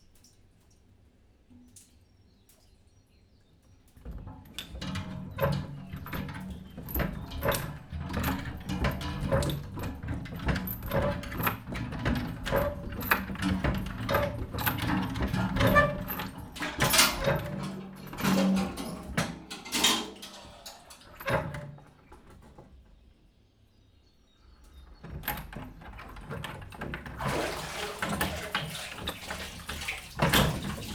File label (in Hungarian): lassu vizhuzas, viz visszaontese, kerekes kut felul zart; vízház; cardioid